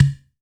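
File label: FX CONGA; AKAI S-Series CD-ROM Sound Library VOL-5; FX PERC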